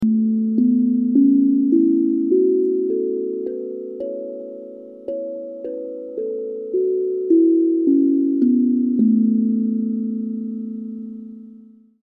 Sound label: haps ad, Hapi Drum; Perc-melodisch